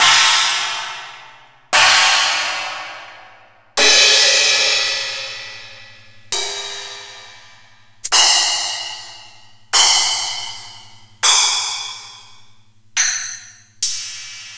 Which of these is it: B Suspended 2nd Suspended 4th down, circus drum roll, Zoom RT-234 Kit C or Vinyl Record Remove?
Zoom RT-234 Kit C